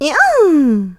Stylish Woman Yeow

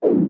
View Lock Off; Toggle view-lock (holding Z)

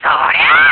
Spin Attack; spin-attack shouts; Link's spin-attack shouts

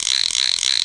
Picto Box Zoom

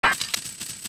Door Unlock; Zelda; OOT

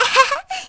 Laugh; Medli; zelda sounds

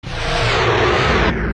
Ganon Dizzy

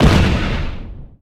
Bomb Blow; The bomb exploding; Eldin

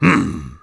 Hmm Grunt; "Hmm!" (grunting); Sir Combsly

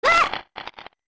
Scared; Skull Kid getting scared

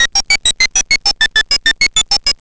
Enemy Flap; An enemy flapping its arms; zelda

